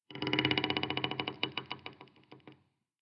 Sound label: wood creak